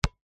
basket ball med bounce single on asphalt concrete; sport

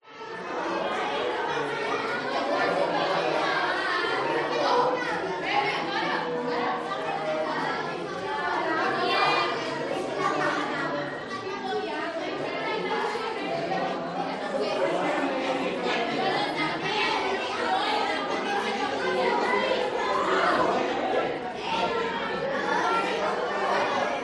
SPANISH CROWD School Cafeteria Kids; gain walkers

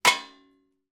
impact metal dustpan

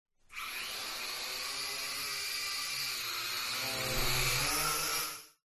Drone Falcon; fox audio

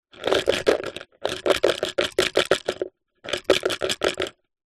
office pencils in plastic large pot shake; zapsplat

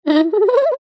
cartoon character cute high pitched shy laugh

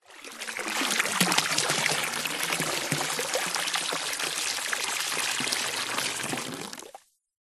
nature water pour medium amount deep

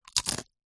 eat bite crunch tortilla chip; human; zapsplat

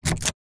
vehicles car hyundai trajet key remove ignition; 2006

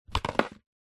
bicycle helmet set down ground; vehicles; zapsplat

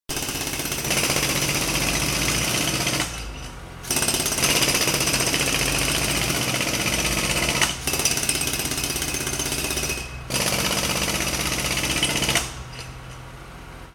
industrial tools jackhammers operating; sound effects one

